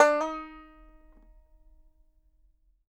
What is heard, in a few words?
BANJO NOTES, EB4; Sampleheads; Whole Lotta Country 1 & 2